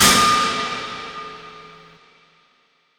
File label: Hits & Explode